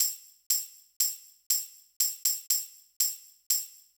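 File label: tambourine; hydrogen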